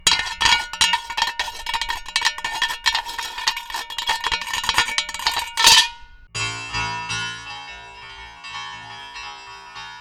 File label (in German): Aluplatte; Moodal Test